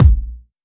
big kick drum sample D key, Royality free kickdrum sample tuned to the D note. Loudest frequency: 527Hz